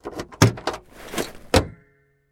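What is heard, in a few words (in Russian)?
долгий металлический звон закрывания дверей ключами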